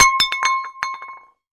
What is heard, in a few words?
Dish Ceramic Bowl Dro; clay & ceramic